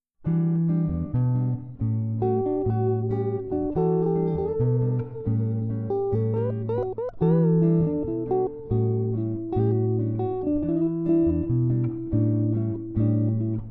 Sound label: Bossa Blues